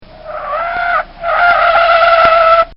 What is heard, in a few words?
Volvo Braking Hard; Cars; Vehicles; Bobby Cole; Car Sound Effects